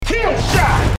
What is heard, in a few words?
kill shot